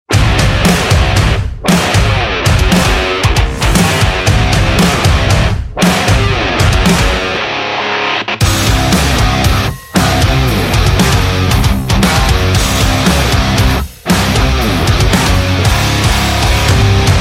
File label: instrumental